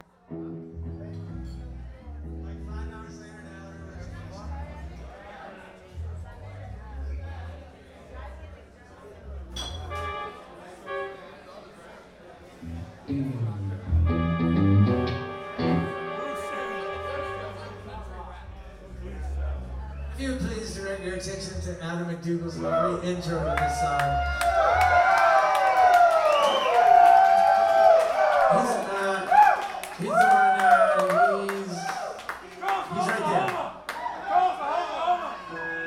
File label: Tuning